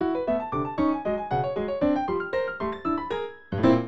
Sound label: drumless; Nintendo GameCube